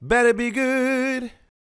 Better Be Good, Male Vocals

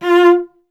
STR VC F 2, Cello; Miroslav Vitous; Solo Instruments VOL-1

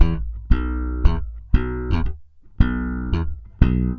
SM BS EM, BASS SAMBA

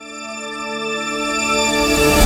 REV CHORD; AKAI S-Series CD-ROM Sound Library VOL-1

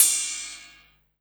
RIDE, 180 BPM; Ueberschall; Drum & Bass Resonance

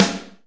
Reverb Kick Snare; PS-37 Dance Synths; Percussion

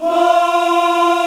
AFRO AH F 4, CHOIR AHS; Spectrasonics; Heart of Africa

